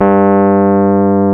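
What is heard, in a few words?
piano Rhodes Mk.V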